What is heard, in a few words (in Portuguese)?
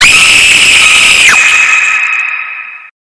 gritao susto